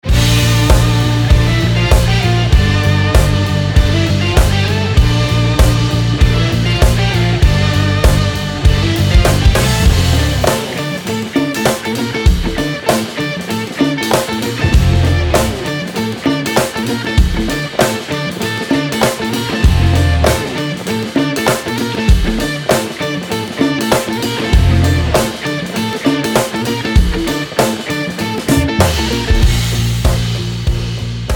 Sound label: Rock Pop Band; West Sussex